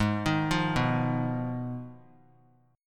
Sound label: Ab Minor 6th Ab, down, 3C, 3F, 3A, 3D; Abm6 arpeggiated; JGuitar